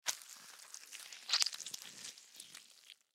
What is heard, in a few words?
Surgery Incision Scalpel Squishes Guts; Hospital; Emergency